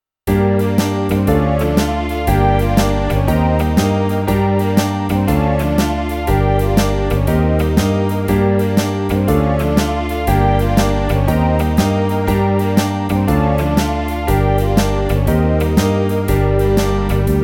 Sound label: Blues Rock in Gm Country 120